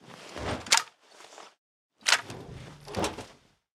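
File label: Weapon gatling laser reload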